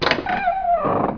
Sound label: door open